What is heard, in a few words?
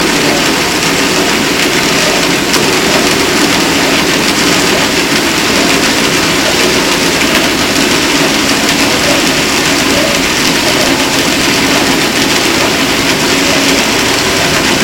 Hydrophones, orcasound